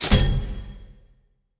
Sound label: module install; World of Tanks Blitz